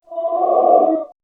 skinwalker goatman idle; slender skinwalker

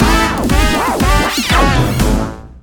game over; tf2ware ultimate; music game

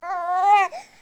baby talk; tm baby; slender

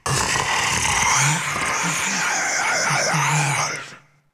gorefast idle; kf2; gorefiend; slender

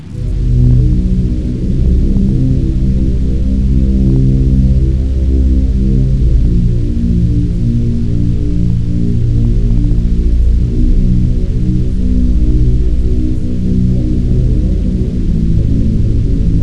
dog alert bgm; lethalcompany; zerosiki; slender

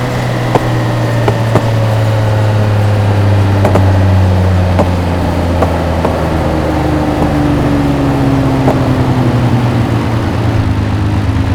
revdown; skyed; nfs heat ganimator pack

